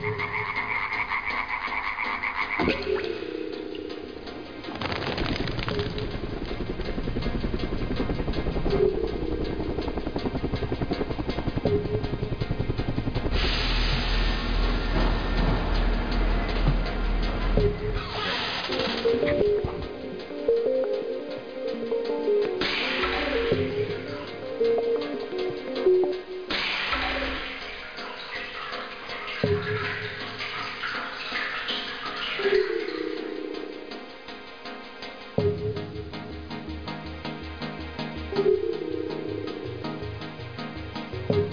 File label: ambient